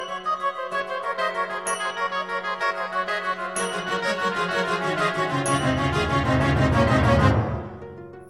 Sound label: Fast Beats